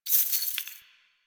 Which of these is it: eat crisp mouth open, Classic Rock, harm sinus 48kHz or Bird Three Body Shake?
Bird Three Body Shake